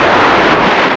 finger cast; weapons; dota; lion

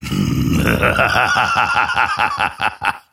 grimstroke laugh; dota

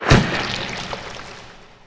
sharpshooter target; weapons; dota; hoodwink

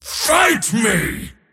attack pain; dota; mars

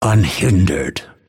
move pain; dota; phantom assassin

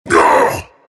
morph pain; terrorblade

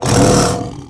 death black drake; dota; creep deaths

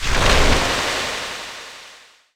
ice vortex; weapons; dota; ancient apparition

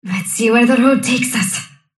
sylph move pain; dota; dark willow

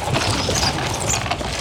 engine move; weapons; dota; creep

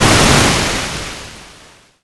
gush; tidehunter

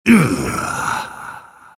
crown grunts; dota; monkey king

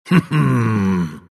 grunts; dota; monkey king

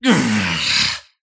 parry fail; dota; kez

